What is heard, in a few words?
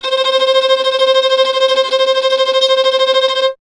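string violin tremolo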